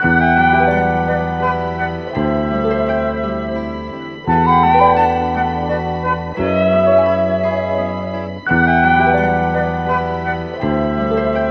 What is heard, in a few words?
flute, Jazzmatazz loops